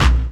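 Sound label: snare drum bass drum, Dance Mega Drums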